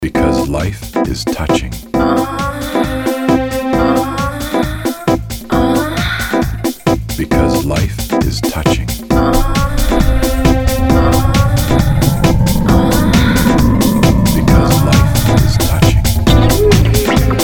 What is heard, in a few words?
Funky Mix